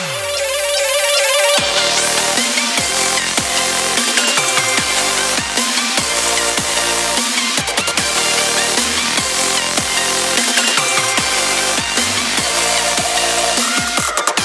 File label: Low Cut Model, Low frequencies filtered using modelled filter; Modelling Filters on a DJ Controller